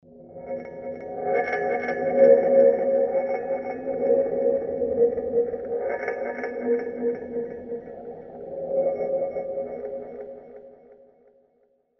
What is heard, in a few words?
scary clanking